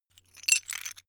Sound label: metal pieces clatter short